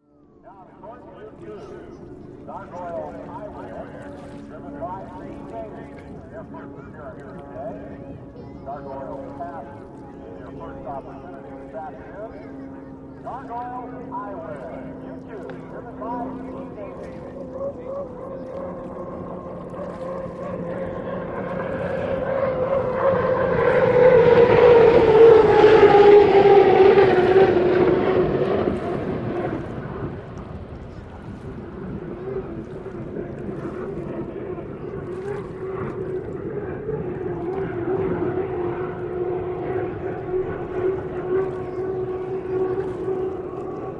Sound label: Hydroplane By Ambie